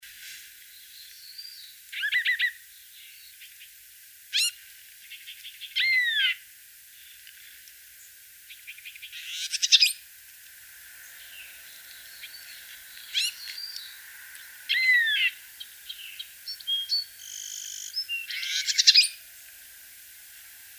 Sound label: plumbeous vireo, secondary song car filtered out